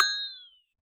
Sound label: glock fx; Univers Sons - Raricussions